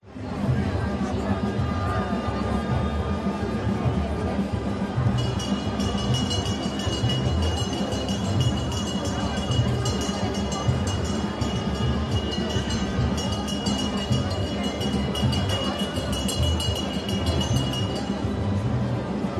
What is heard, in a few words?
atmos protest steady chants distant street band, london; london music mixing